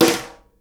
SNARE

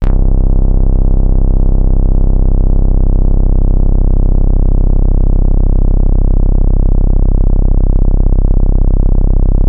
C1, Korg MS-2000; Synthworx

